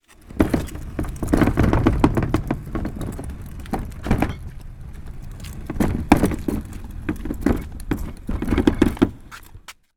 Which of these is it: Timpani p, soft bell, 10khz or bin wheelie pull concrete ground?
bin wheelie pull concrete ground